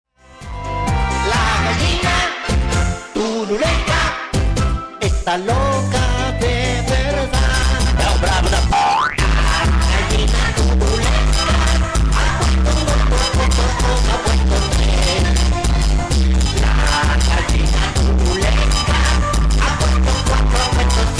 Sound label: phonk brazilian